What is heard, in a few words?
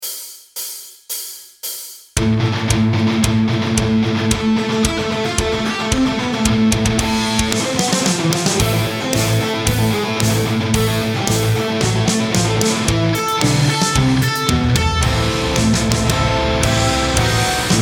Neoclassical Etude In Am